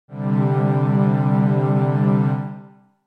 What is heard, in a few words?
C Suspended2